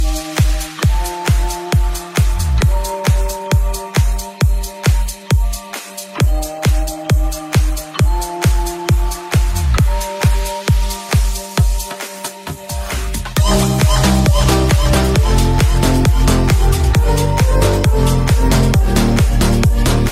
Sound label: shuffle dance music ringtone